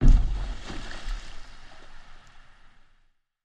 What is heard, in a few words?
depth charge, underwater